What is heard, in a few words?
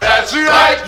that's right SUNG